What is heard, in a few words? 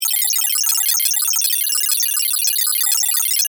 Data Readout Beeps, Scifi; Tech; BlastWave